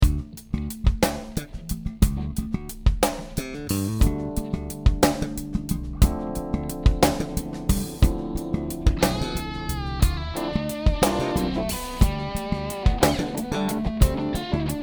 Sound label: Duet